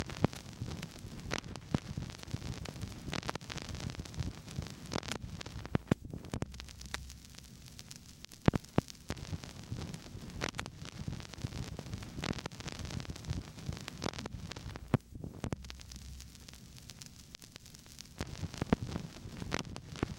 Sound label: ambience & sfx